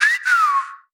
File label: FX Whistle, Hip Hop; FX Collection